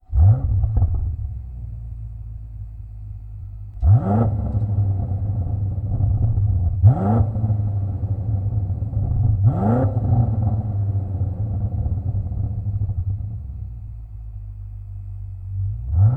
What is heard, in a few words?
BMW M8 REMUS Axle-Back; Aerotechnik